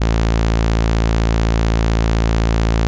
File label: tone